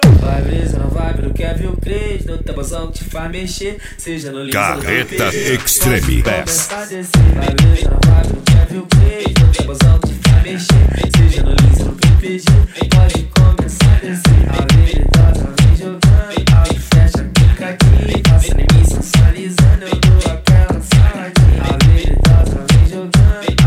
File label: extreme bass; carreta